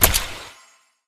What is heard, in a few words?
spin Button Click; netent